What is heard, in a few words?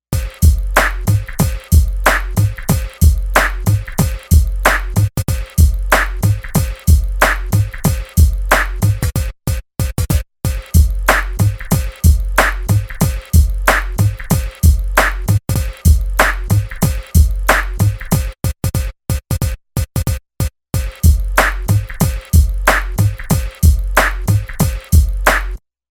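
Drum Loop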